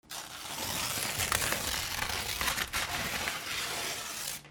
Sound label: RIPPING